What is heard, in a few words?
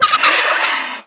Messenger Buzzard Play